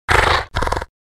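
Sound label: snorting of werewolf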